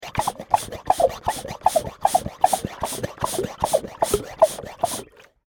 Pesticide Pump, Extermination, Pesticide, Pump, Fast, Wet, Insect, Bug; Sound Effects:Animals:Insects